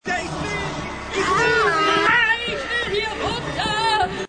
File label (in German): schrei